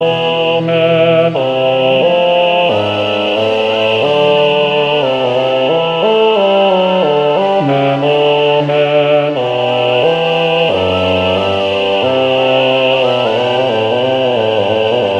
basse sicut

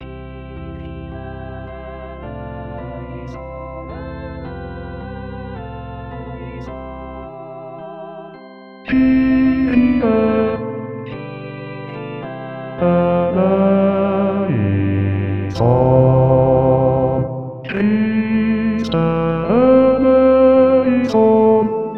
basse kyrie; messe breve aux chapelles gounod; BASSES

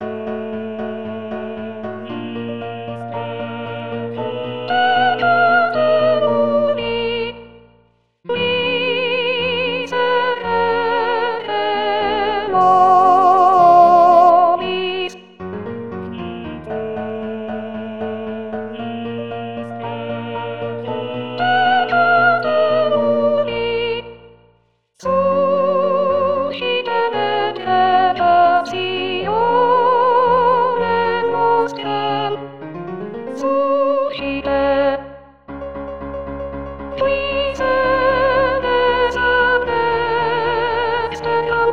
gloria sop

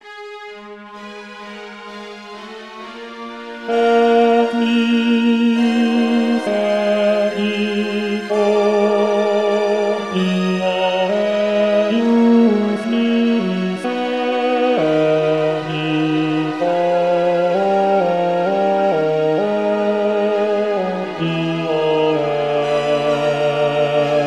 bass et misericor

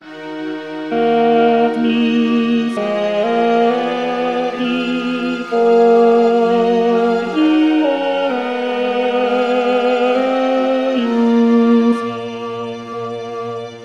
ten et misericor

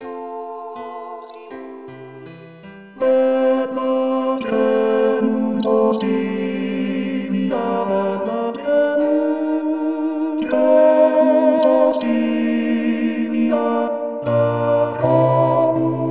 bas o salutaris; messe breve aux chapelles gounod

